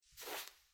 シーツの上にあるスマホを手に取る音

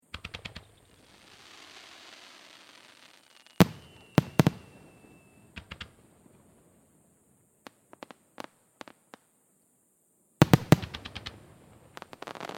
花火の音 連射; firework

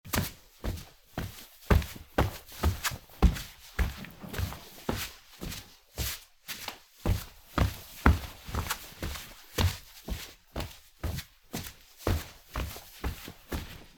畳を歩いて近づいてくる足音; walk

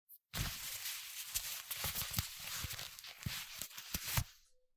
ジーパンのボタンを止める音

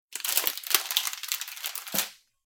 シンクの下の調味料を漁ってる音

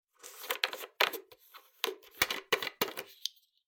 ペットボトルのキャップを外す音

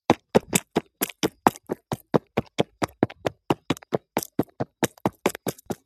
スニーカーでコンクリートの上を走る音; sneaker

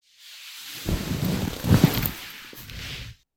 布ソファに座る音; sofa

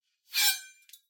まな板にある包丁を取る音

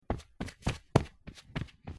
カーペットの上を走る音; carpet

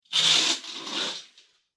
レースカーテンを開ける音; curtain

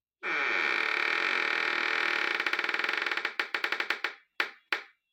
ドアがきしむ音; door

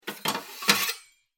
包丁を取り出す音

